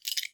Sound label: handle Coins; Kenney's Sound Pack; RPG Sounds